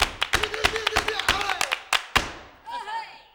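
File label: CLAP + VOCAL, MCLAPS+VOX; Best Service; World Colours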